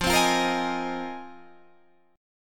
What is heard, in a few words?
Bb 7th Suspended 2nd Suspended 4th F